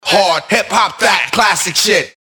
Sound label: HARD HIP HOP CLASSIC